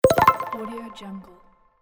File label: menu confirmation; gamechestaudio